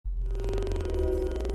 power coins

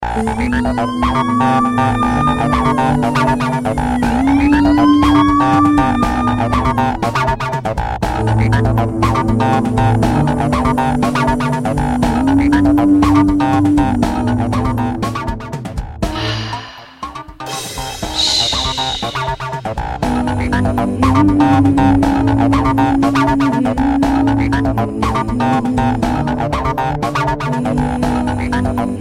Music

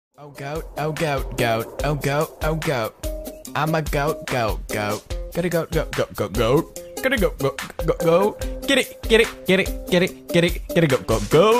Goat Song

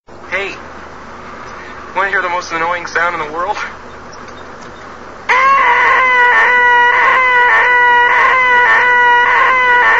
Most Annoying Sound

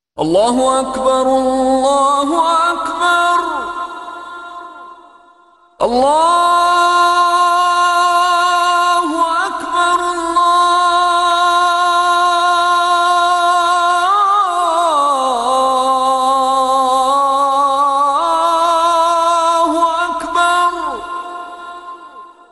azaan

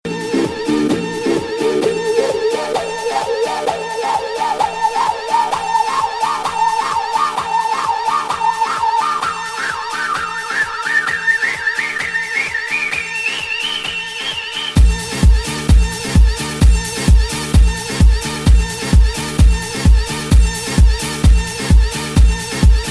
House

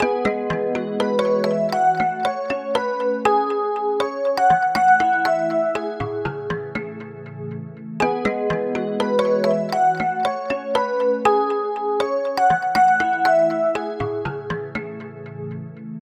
Good Morning Alarm

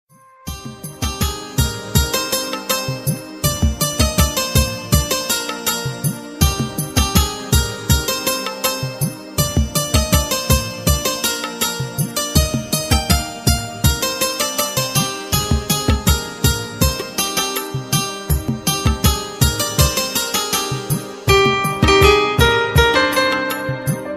Surround Music

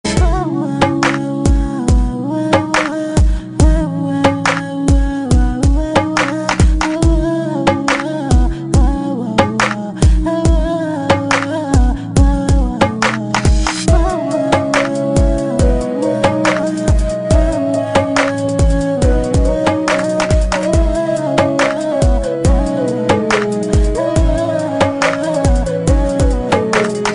Humming; kettavan

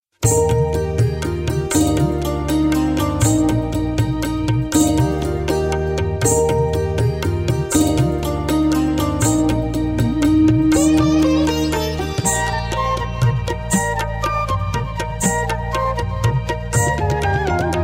Sitar Tabla Flute

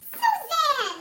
Gasp

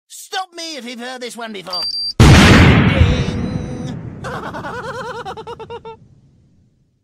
Ding; Junkrat

